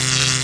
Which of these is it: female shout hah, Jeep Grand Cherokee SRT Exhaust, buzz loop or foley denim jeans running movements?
buzz loop